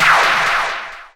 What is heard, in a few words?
Scratchy, FX; Vengeance Samples